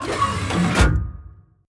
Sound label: gun reload; World of Tanks